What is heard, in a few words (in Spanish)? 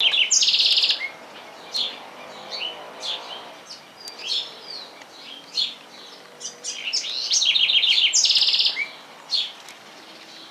ratona voz; aves